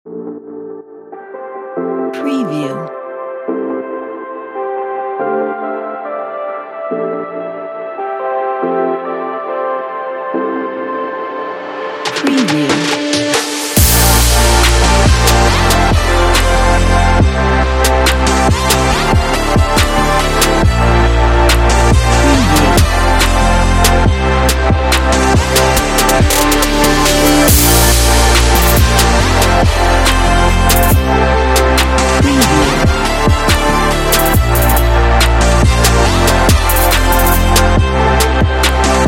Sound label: future bass powerful pop trap; موسیقی مخصوص تیزر